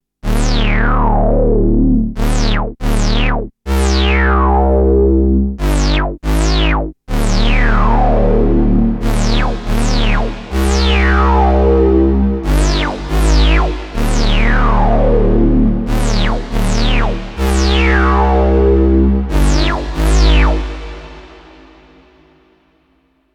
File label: KORG Synth Sweep; KORG Hollow